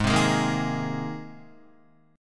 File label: Ab Major Add 9th Ab down Guitar; Abadd9 strummed; Abadd9 chord; JGuitar